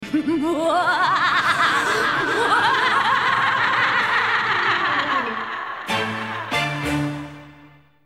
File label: evil laugh